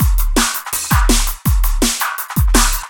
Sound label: New Tech DnB